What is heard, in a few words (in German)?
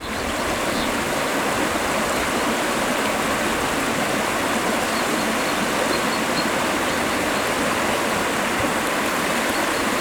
Filsursprung mit Vogelzwitschern; Filsquelle